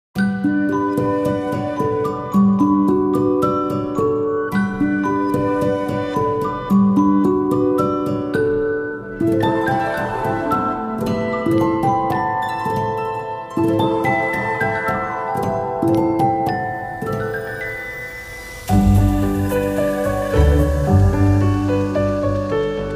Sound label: Orchestral, energetic orchestra